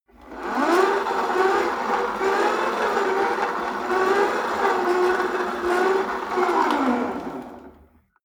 rope trainer pull sequence medium long, Fitnessstudio; sport freizeit